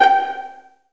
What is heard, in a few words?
PIZZ G3, Strings